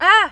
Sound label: surprise